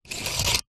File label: office stapler plastic cartridge spring pull back